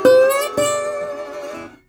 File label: Blues Guitar Samples; ILIO - Fretworks